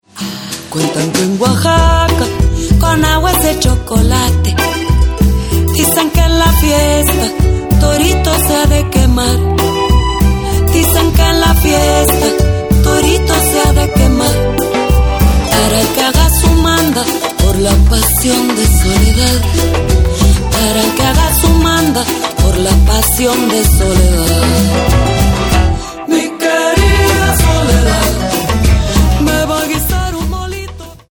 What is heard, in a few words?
cumbia